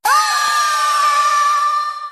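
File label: wheel start additional effect; Fruit Fiesta; Wazdan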